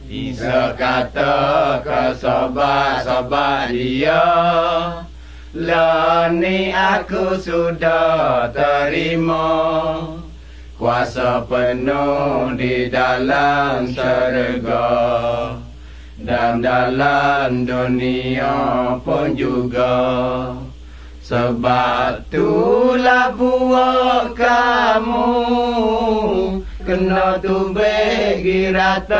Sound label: songs malay pattani